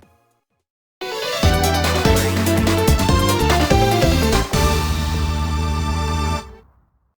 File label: BGM Music; YuGiOh Over the Nexus